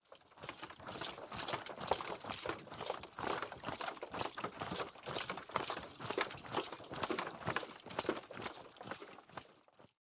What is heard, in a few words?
March; Age of Empires III; cinematics